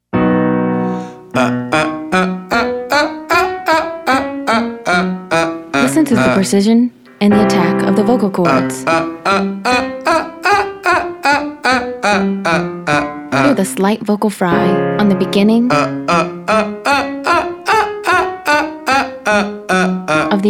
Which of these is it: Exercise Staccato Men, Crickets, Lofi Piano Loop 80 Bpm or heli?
Exercise Staccato Men